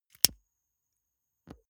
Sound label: lighter electric switch flame on blow out